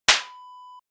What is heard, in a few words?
slap in the face